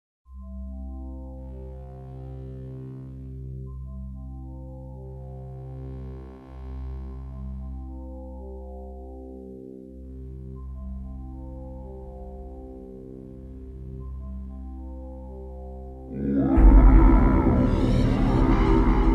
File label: Chant; Damned Souls